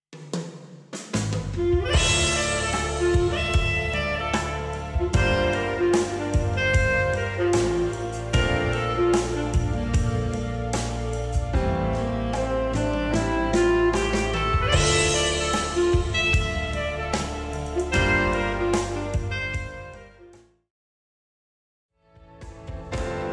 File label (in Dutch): Saxofoon backing track samples